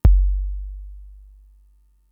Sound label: Kick, Drum Machines Fricke MFB-522